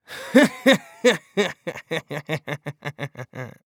Horror Scary Human Male Laugh Evil Villain Laugh

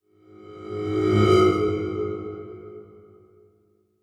Title Transition Laser Long; Production Element

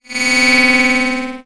Multimedia Internet CD-Rom Flash Hi-Tech

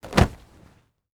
Horror Body Fall On Cement

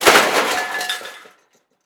Crash Metal Objects Hitting Cement; crashes; AdobeCC

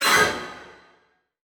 Transition Metallic Blast; Production Element; Title Transition; AdobeCC

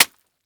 Liquid Water Mop Wet Smacking Concrete Hard

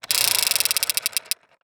Foley Toy Spin And Rattle

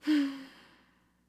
Human Female Sigh

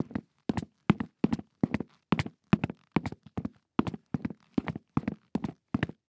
Foley Footstep Golf Cleats Running On Cement; Foley Footsteps

